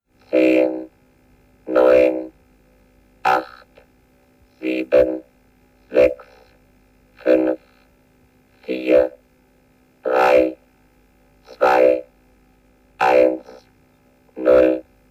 countdown ringtone; raumpatrouille orion

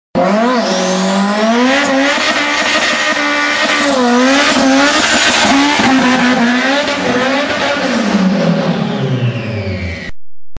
engine car sounds honda civic; honda civic killer

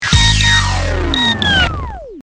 accu empty

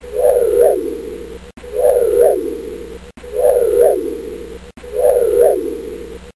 kitt sound; knight raider

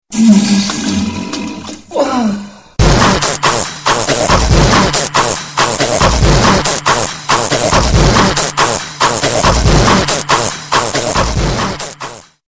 fart technotone; sms klingeltoene

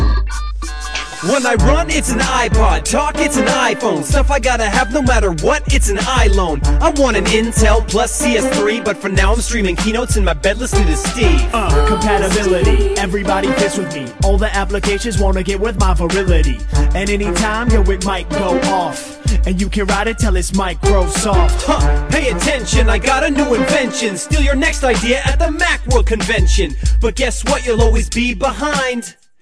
iphone rap

